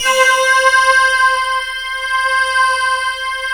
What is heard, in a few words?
C5, SYNTH; NEURONAL; Q Up Arts; Dream Experience